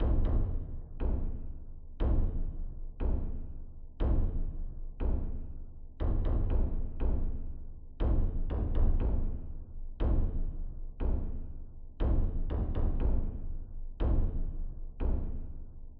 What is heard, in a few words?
Volcano Drums; Veilia